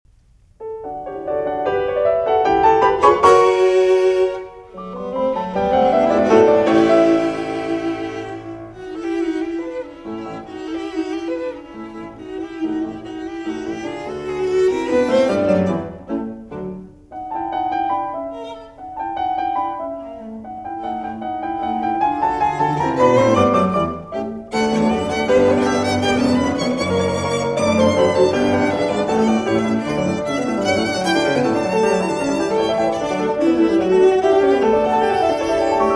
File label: Piano Trio in D Major, Presto; Piano Trio No. 5; Op. 70 No. 1 Geister-Trio